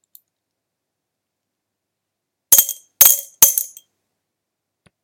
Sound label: Auditory Icons Balls; oviatt